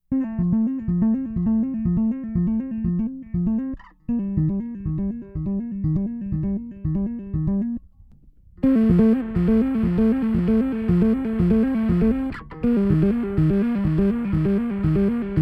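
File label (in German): Drive Solo Out Rechts DI Links, bass; BB-1X; Bogart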